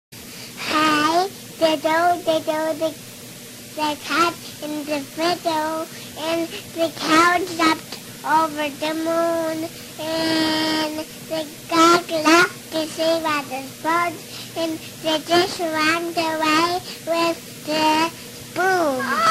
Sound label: Hey diddle diddle 2yrs